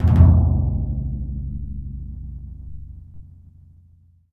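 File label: timpani C#, Synclavier Percussion; ILIO; Synclavier Percussion 2; World & Orchestral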